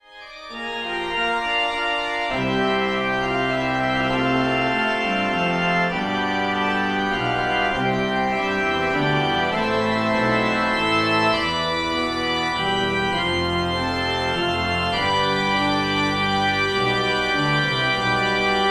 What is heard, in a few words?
christmas for organ; magic